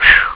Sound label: short wind; ST-XX Amiga Soundtracker Sample Packs